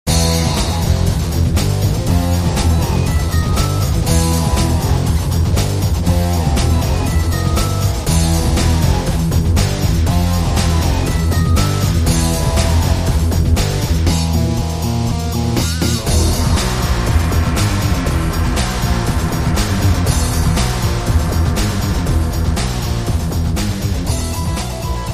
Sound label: Electro Beat